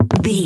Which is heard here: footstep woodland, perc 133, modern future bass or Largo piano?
footstep woodland